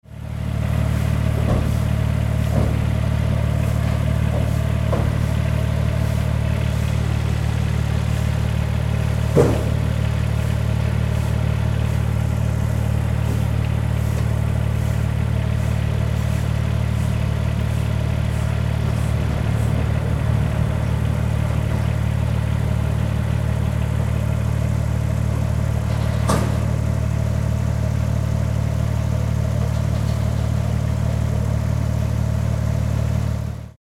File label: forklift truck engine noise